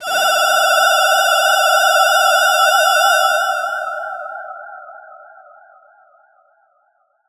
Classic FX; radiosonics; Classic Simple OSC FX

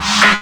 PERC, Drum & Bass, 130 BPM; Ueberschall; Drum & Bass Flash Trackz

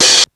Hat Open, Ensoniq Mirage drum machine

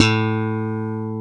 CHOP A2, bass

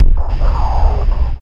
FX SWEEP

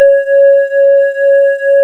C#, Synclav FM, WIND; Big Fish Audio

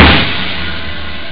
cool snare; jazz jackrabbit 2

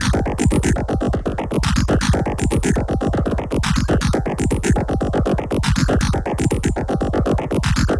Anabeat Mix, Beats

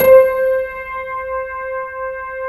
PNO HEAVN; EDENPNO

